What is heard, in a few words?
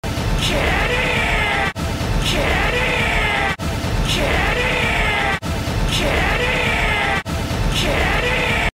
screaming; levi ackerman; kenny